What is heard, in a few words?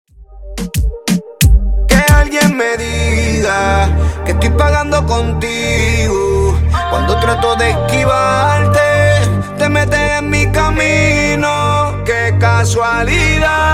Dj Intro Outro Clean Dirty 90Bpm